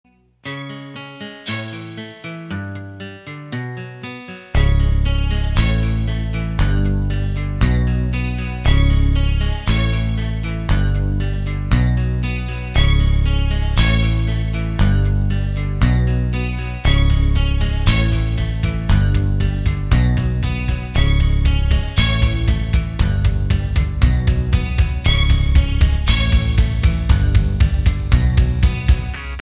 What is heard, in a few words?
SY99 high strings